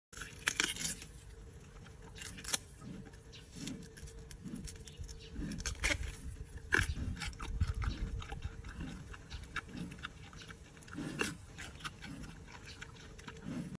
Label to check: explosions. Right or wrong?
Wrong